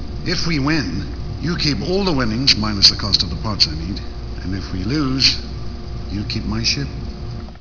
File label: win lose ship